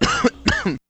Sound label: Cough